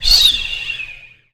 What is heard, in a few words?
launch